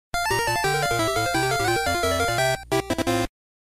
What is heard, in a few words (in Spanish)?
Cambio de localización Pinball